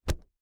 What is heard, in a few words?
Impact Cloth Hit Cloth; Impacts